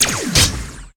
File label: Poison Attack; Inotia 3; Rouge